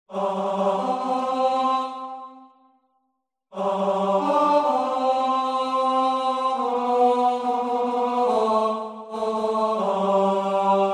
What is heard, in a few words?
Men